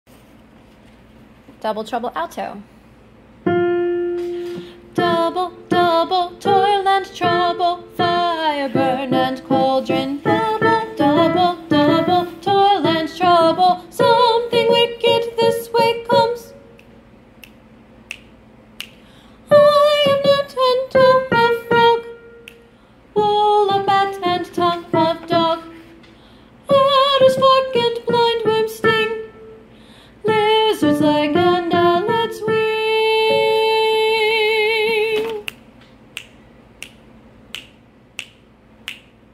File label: alto